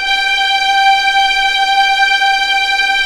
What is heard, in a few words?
G5 EXP MF, VIOLINS; East West; The Ultimate String Collection